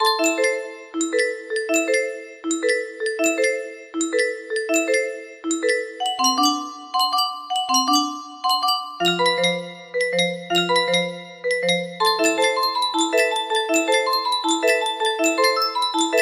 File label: music box